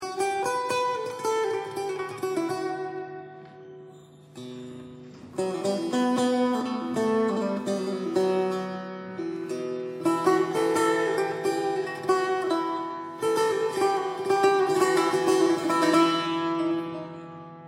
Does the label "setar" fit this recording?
Yes